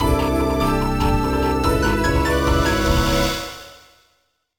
BGM STAGE BATTLE; Phantasy Star Zero

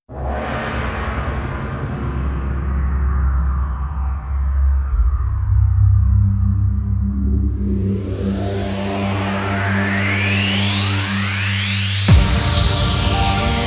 Trance Remix; Super Shot Bishoujo Game Remix Collection; Shukufuku no Campanella; StripE

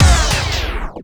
DJ Disco Stop; Plants vs. Zombies Battle for Neighborville

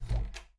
Door Close; miside demo windows gamerip 2023

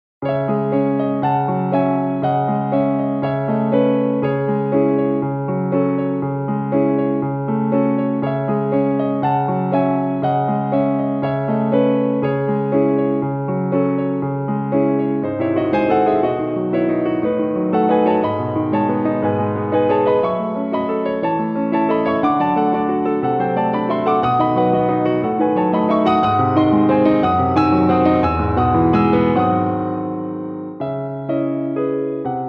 Piano; Blank Dream

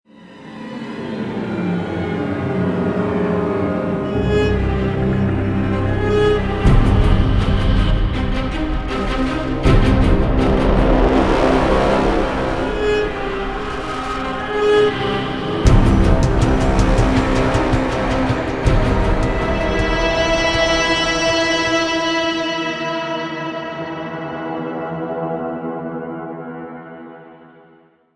Violin Ambient; crysis 2; ps3; xbox 360

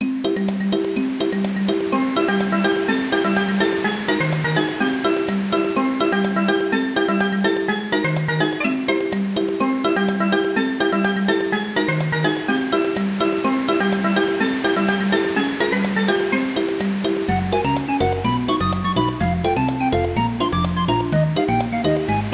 (with Ambience); Banjo-Kazooie; Treasure Trove Cove

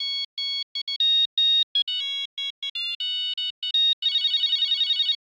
ringtone funky

